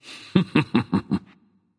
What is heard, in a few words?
"heh heh"; smite; voicepack; poseidon